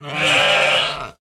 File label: Ram Death; Tavish's